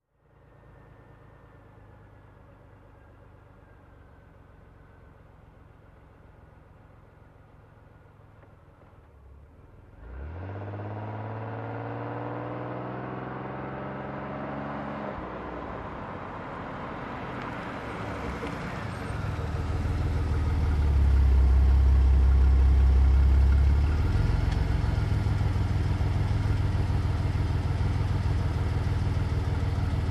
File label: Car parking